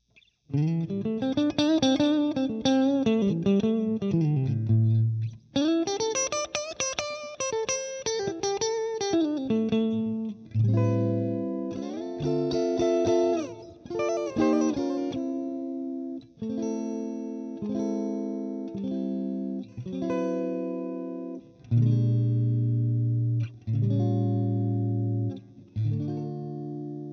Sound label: Spark Electric with presence